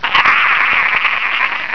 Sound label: slave die